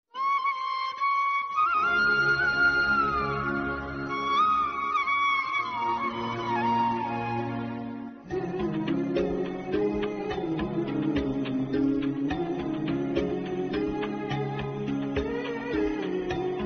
instrumental flute music ringtone; anupama; anupama maa; anupama serial

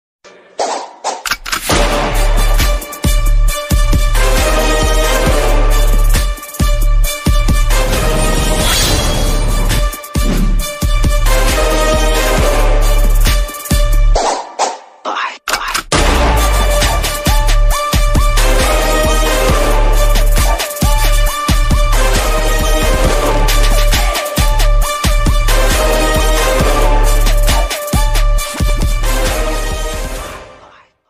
bgm remix ringtone; padaiyappa

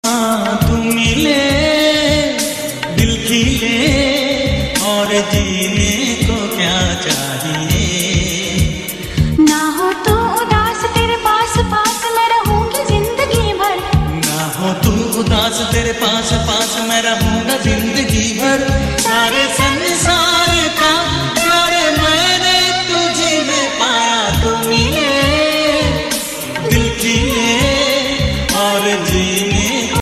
old song

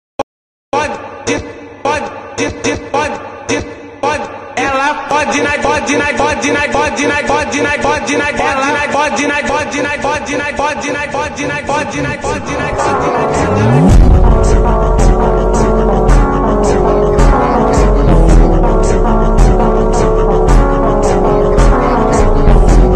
phonk, attitude ringtone

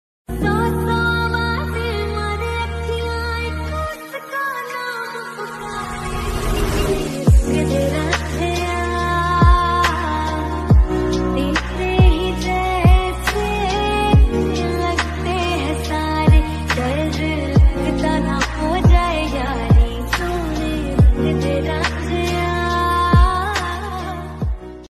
lofi mix, hindi

